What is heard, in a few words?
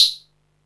hat, Techno 138 BPM